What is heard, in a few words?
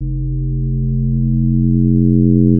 bassss